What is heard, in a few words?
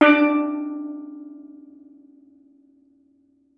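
STEEL DRUM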